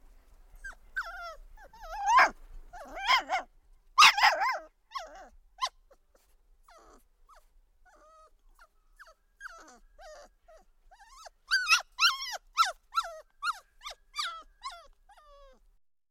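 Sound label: zvuk laya shenka, dog barking, puppy barking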